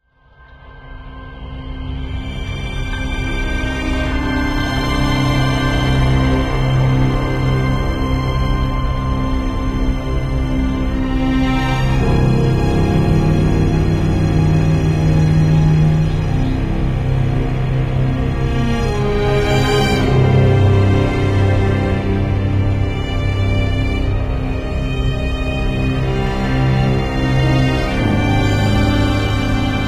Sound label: Strings; Act 1 music